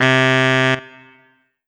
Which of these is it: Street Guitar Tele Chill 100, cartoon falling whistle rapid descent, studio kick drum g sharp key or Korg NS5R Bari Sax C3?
Korg NS5R Bari Sax C3